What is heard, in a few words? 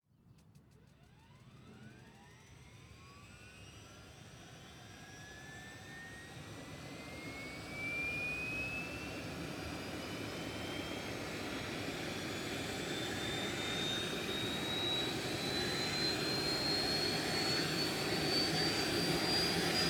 Helicopter Start Up, Helicopter engine start up, rotors start; accelerate and lift off